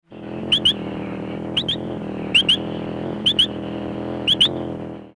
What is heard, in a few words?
Silverstoneia nubicola, Poison Dart Frog